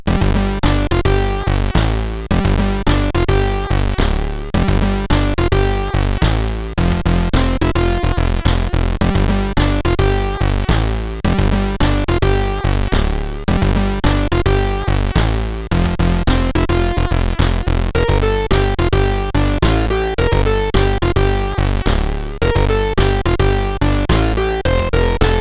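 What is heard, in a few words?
Lights On, MOS CSG8580R5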